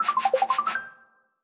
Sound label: Wall